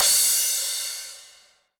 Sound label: drum hit whistle